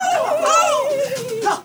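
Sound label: FEMALE RAVE; Spectrasonics; Heart of Africa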